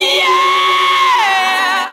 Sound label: yeah, sung